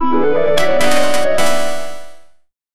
retro fantasy rpg game; slots

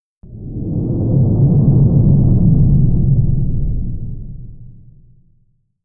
a spaceship landing with a low mechanical hum

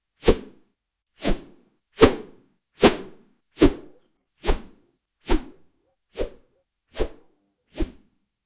person slamming a person, fighting

